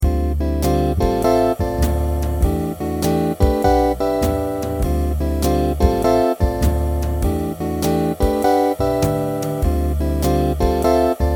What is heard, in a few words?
jazz finger style, guitar